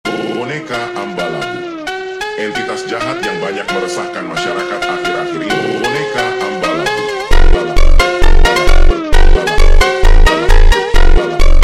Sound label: Funk sped Up, Phonk